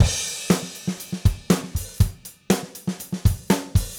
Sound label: Gretsch groove 120 BPM, 1967 Gretsch Kit drums